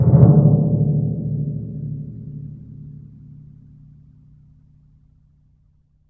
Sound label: TMP, Synclavier Percussion